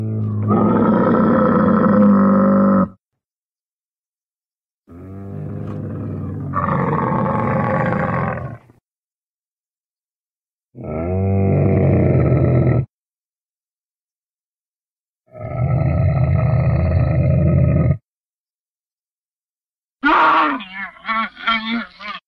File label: Camel Sounds